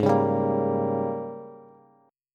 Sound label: Ab Major 7th Suspended 2nd Suspended 4th Ab, down, Bass; strummed; JGuitar